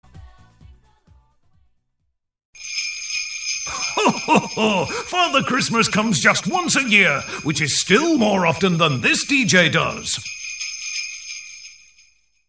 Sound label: C Christmas Jingles